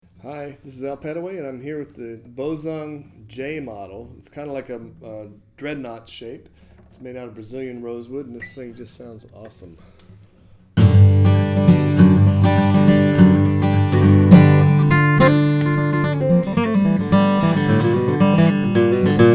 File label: bozung vintage bone dreadnought, steel-string flattop guitar; bozung cb guitars; dreadnought herringbone